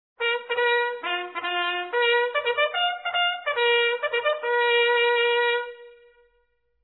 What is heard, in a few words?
Sick, bugle calls